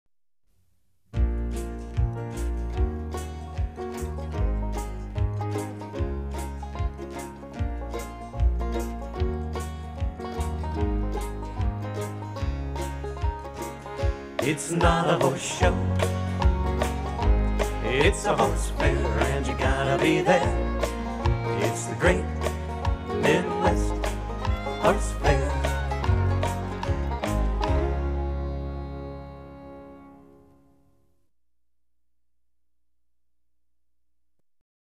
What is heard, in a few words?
Jingle